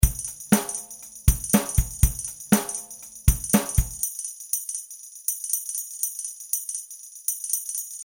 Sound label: Beat Delay